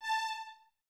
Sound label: Violins Detache A 5; Miroslav Vitous; String Ensembles